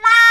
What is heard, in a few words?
Trpts